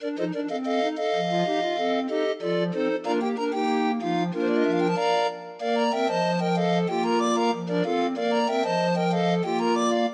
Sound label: Grind Organ